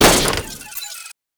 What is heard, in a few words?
Electronics Misc Destroy; Warframe; Ambience; NineteenNinetyNine